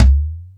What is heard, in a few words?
House Machine, Drum Machines